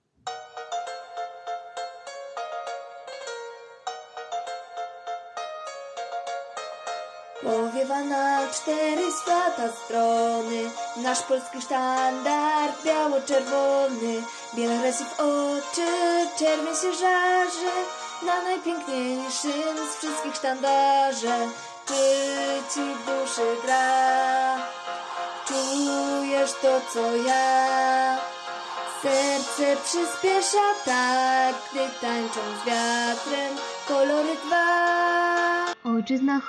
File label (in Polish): piosenka patriotyczna; Flaga Polska